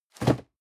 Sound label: cardboard box medium place slide hard; objekte